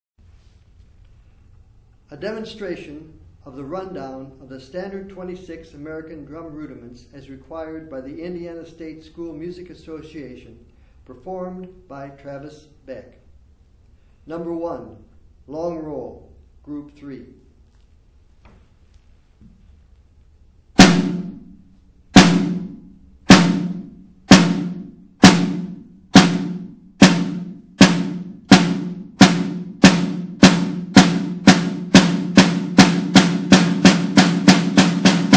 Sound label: snare rudiment